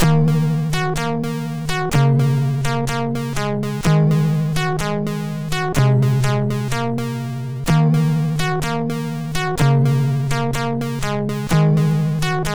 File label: Music intro, MOS6581R2; sid